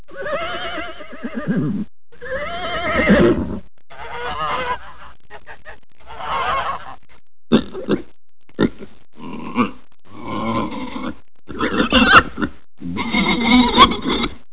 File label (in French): a la ferme, Animaux